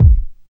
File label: bassdrum; Ueberschall; Jam Box